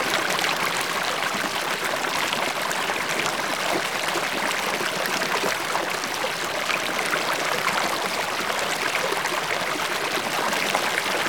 Stream Water, Medium Flow; lilesound

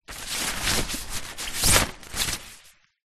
foley tent door canvas material movement; zapsplat

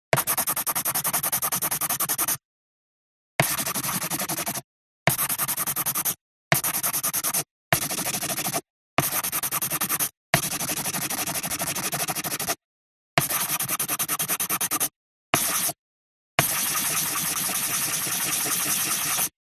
Drawing Pencil Desk

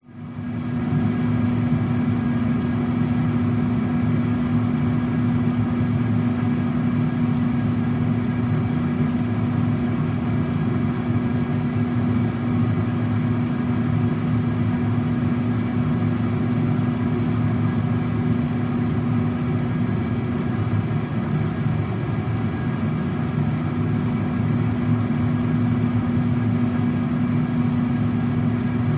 server room ambience; Sound Spark

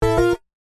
multimedia game sound synth tone bold error

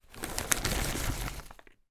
food peanuts roasted pour into hand from packet

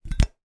household whiskey decanter glass lid put in

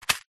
foley stones very small in tin set down ground; zapsplat

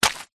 footstep single walking wet forest floor; foley; zapsplat

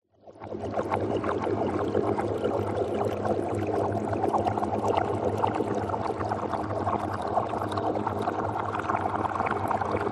sound design weird bubbles reversed reverb delay

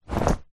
foley shorts combat drop on floor; zapsplat

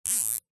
industrial zip tie short tighten clicks

